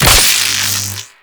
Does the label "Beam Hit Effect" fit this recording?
Yes